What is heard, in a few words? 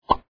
pop